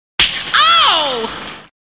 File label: Whippin